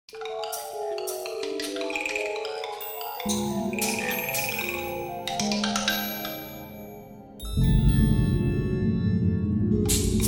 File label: Percussion Experiments